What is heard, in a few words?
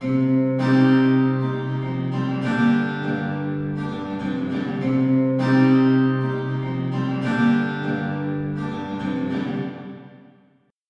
Perfect Space AC Guitar Medium Dark Plate